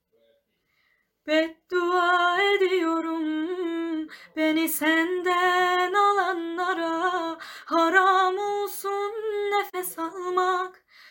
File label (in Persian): torki ghamgin ba gitar